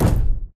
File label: Footstep Jog Mid; Titanfall 2